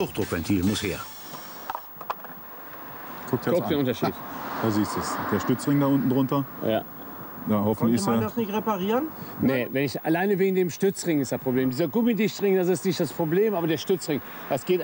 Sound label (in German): Fiat Raildruckregelventil